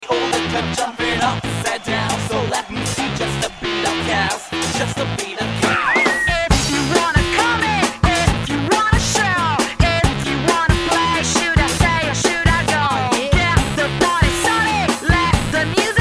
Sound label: Haha; Ringtone